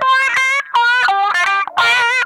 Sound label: TIMER, Funk Guitar; Zero G - Funk Guitar